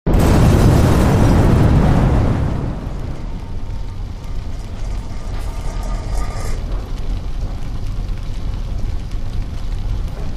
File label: Car Explosion